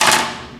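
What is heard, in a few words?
physics clipboard impact small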